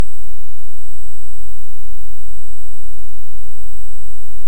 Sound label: Hydrophone; OneOcean